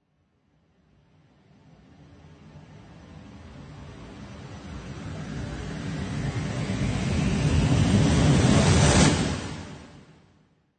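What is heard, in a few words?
Epic Movie Trailer Zombie Claw Rise; 史诗电影预告背景音乐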